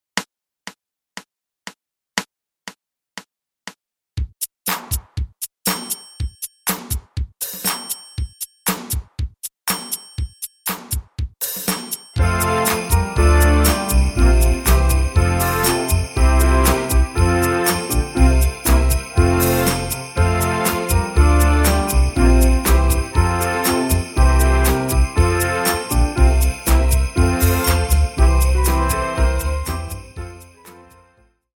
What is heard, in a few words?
tempo 120 with piano; Deck the hall; My First Rhythm Cup Explorations